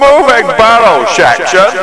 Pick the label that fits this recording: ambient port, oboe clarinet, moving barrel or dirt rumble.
moving barrel